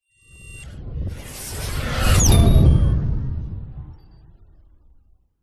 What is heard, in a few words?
science fiction robot burst